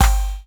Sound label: percussion one shot clave high punch multi; Sample Magic; Classic Chicago House; Drum Hits